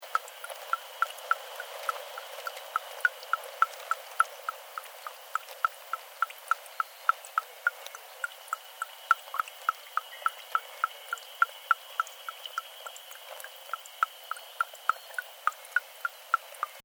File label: Volcanic Water Clear Pool Dripping Loop, Dormant Volcano Japan; Environmental (Sound Effects:Nature); SoundEffects-Foundation4